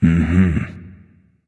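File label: nonverbal agree; smite; voicepack; ullr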